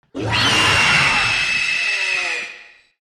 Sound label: Juvenile dragon roar